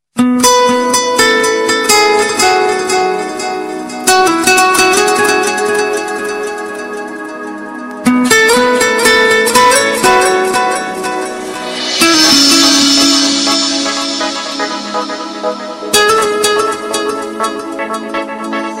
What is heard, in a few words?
lovely guitar